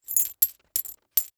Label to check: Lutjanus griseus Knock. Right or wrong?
Wrong